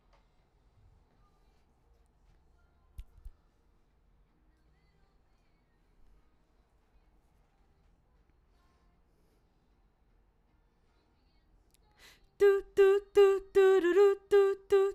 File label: BG Vox